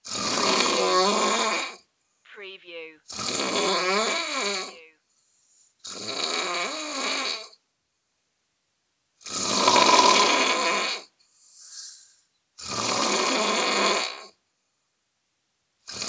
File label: heavy snoring loop